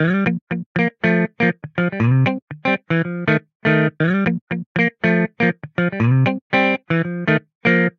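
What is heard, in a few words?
Guitar African Funk G minor 120bpm; Guitar and Bass; Ableton; Factory Packs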